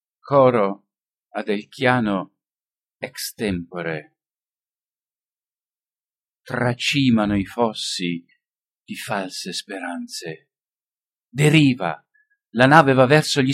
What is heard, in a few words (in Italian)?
coro; adelchiano; ex tempore